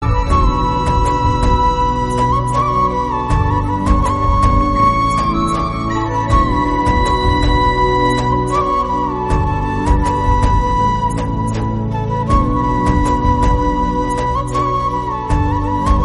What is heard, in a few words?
flute bgm